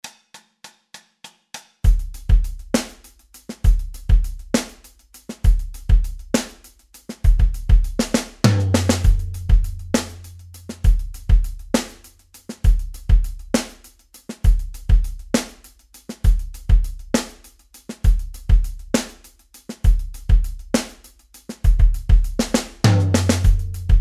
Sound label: Drum groove slap tempo 90